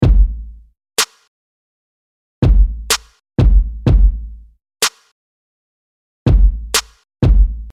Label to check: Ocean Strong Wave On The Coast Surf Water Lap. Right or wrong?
Wrong